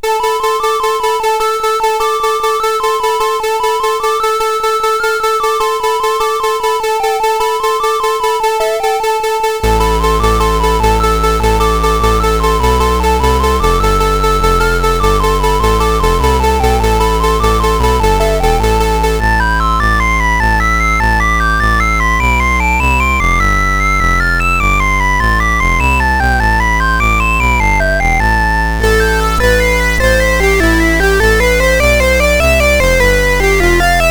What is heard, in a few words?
Irish Ballad, CSG 8580